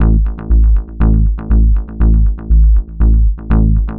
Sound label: Synth Bass